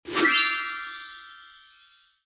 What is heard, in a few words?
confetti